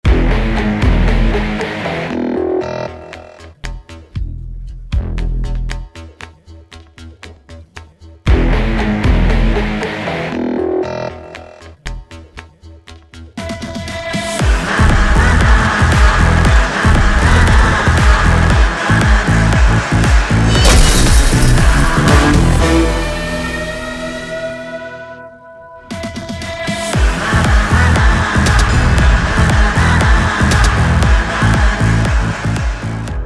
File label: BGM Mix; Family Star